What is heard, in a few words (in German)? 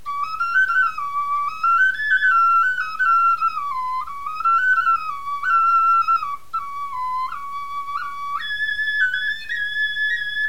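melodie, Knochenflöten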